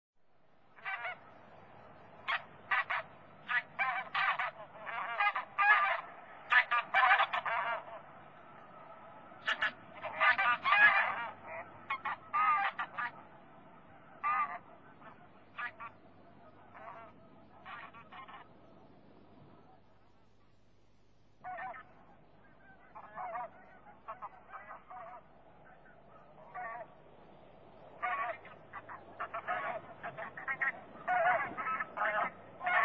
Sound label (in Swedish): ljud sadgas